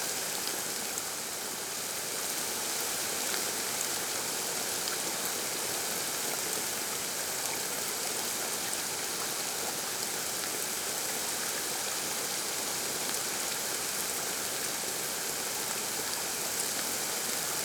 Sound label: ambience rain strong; Rust